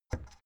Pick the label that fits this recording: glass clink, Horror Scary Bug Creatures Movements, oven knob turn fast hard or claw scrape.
oven knob turn fast hard